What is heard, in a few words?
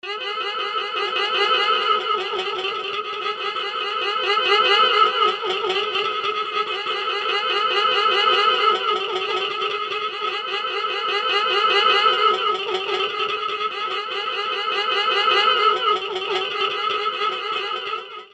creature loop